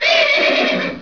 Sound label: pain; wanted